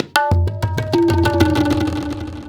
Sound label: TABLAS; Zero-G; Chemical Beats